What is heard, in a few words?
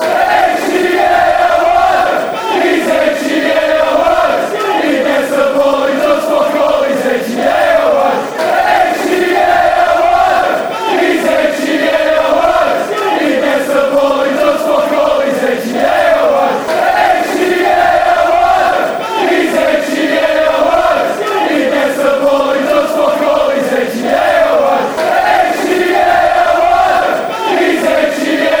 h e a l y fanchants